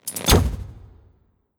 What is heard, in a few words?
Ballista Launch